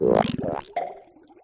Grito de Pecharunt

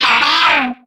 Grito de Lombre

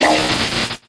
Grito de Silcoon

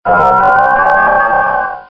Grito; Milotic

